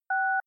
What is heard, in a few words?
DTMF 1